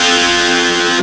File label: heavy; Amiga Soundtracker Sample Packs